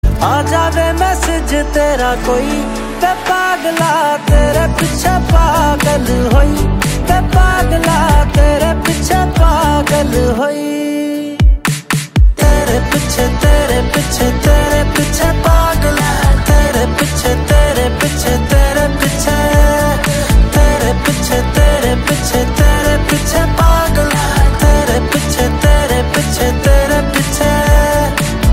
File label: PUNJABI SONG RINGTONE